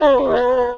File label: wolf hurt